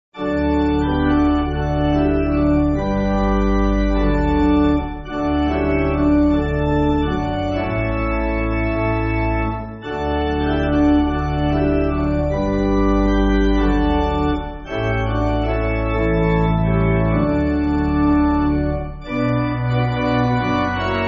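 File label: Pipe Organ, Backing Files for Churches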